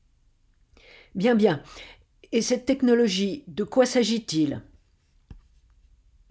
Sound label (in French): techno